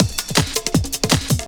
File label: Talk Quick 162, breaks